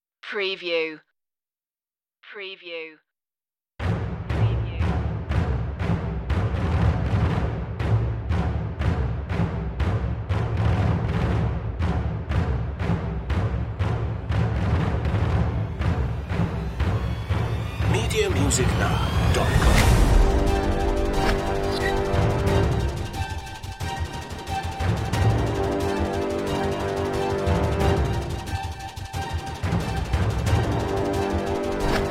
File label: pirate attack no violas no cellos